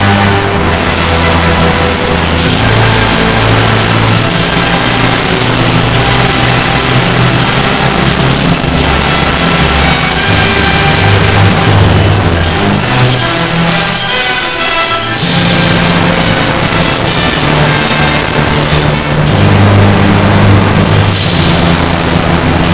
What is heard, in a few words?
natural trumpet; Nutcracker -pas de deux; Piotr Illich Tchaikowsky